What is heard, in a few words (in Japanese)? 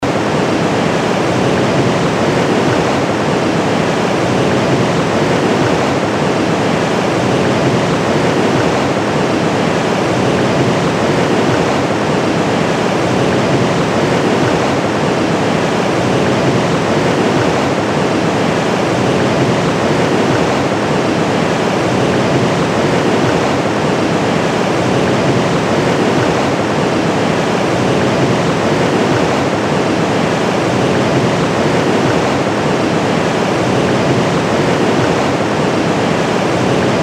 滝